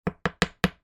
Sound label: office pencil sharpener hit table 4 times to remove flakes